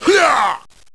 hiyah; zangief

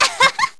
laugh; sakura

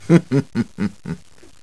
laugh

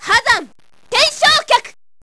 rising hev kick

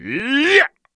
hiyaa

